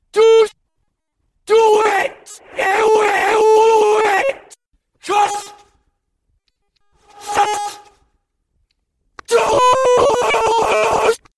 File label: Do It Autotune